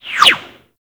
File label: Skiff Bullet By; Grineer; Arch Skiff